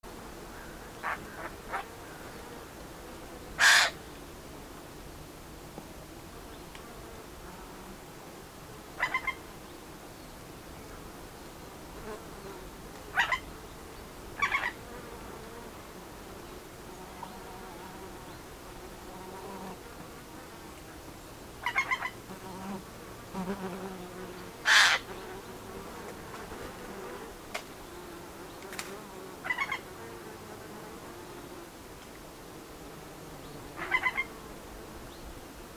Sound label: Garrulus glandarius; SETE